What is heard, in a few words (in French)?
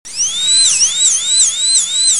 Roulette dentiste; bruitage; Electro-menager